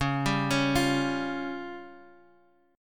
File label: C sharp Major Flat 5th, Guitar Half Step Down; C#Mb5